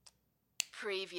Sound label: pen place cap on pen